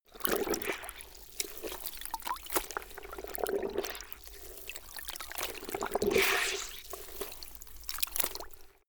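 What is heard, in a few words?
cloth wring out, household; haus-garten; haushalt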